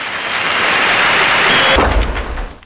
CHEER

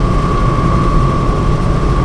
FAN; Incoming Forces; structures

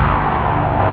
hum, synth chords

